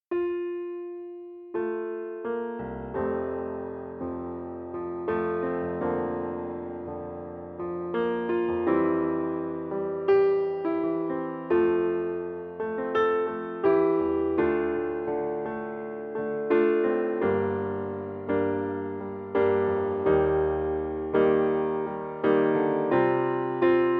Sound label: Accomp; Storyteller; FOLK